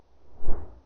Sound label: Fast Swish; Whooshes